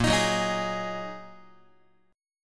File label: A sharp 7th Suspended 4th Sharp 5th down Guitar 4A 4E 5A 5D 5G sharp 6C; strummed; JGuitar